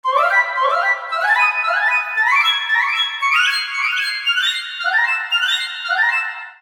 Cave Hi Pic Fl

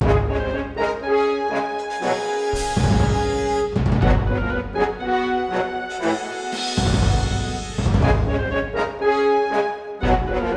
Treasure Box

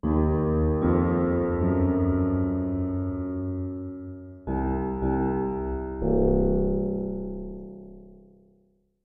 Tension Piano; Mob of The Dead

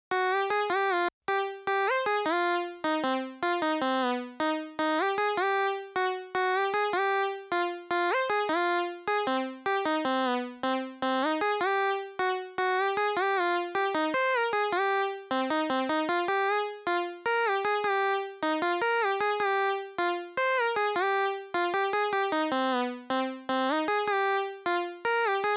Melody; chippy

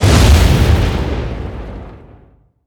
Trap Lightning; kids park kaitou sentai lupinranger vs keisatsu sentai patoranger

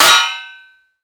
Ricochet; blastmorph pinball; xbox series xs

